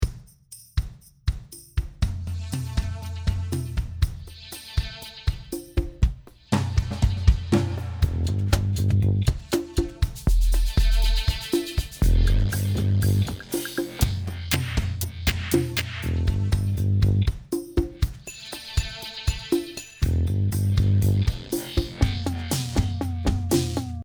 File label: bass